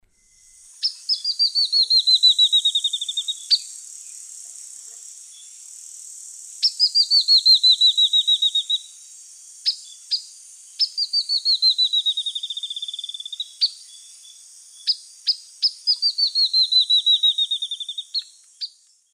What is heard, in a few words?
Sclerurus rufigularis, calls and song; S. America; Eastern Lowlands